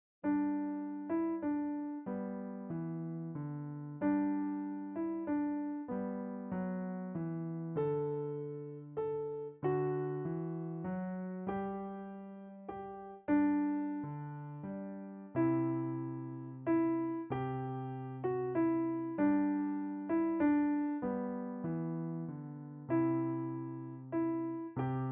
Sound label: stille nacht piano; franz xaver gruber